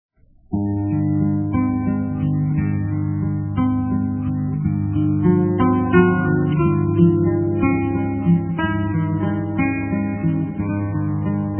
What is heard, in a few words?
Inst Guitar, Instrumental